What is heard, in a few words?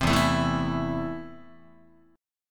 E Suspended 4th Sharp 5th, Guitar, Esus4#5 chord; strummed; JGuitar